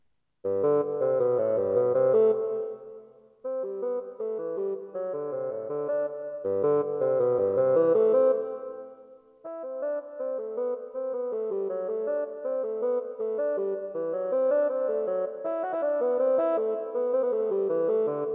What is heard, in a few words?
Bassoon